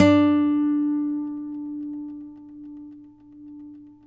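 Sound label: nylon, FLAMENCO, Guitars; Spectrasonics; Hans Zimmer Guitars VOL-2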